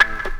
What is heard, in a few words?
WAH GTR KORD, Funk Gtr & Wah; PlatinumPhattCD2; E-MU Producer Series Vol. 8 – Platinum Phatt